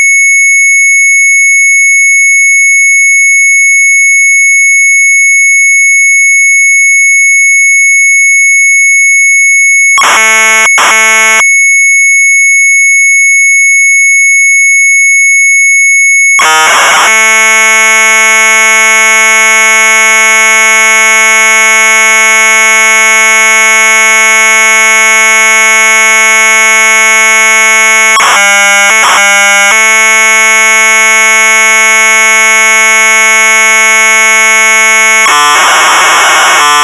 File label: MZ800 Tape; Sharpy's Eng.2A; retromelsarchive